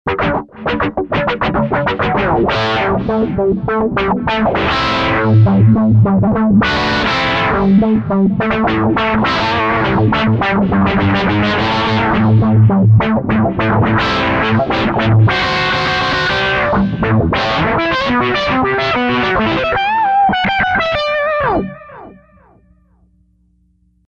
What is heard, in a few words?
FILTRON